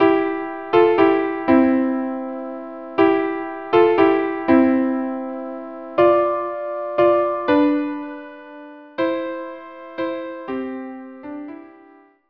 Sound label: violin viola duet; Silent Night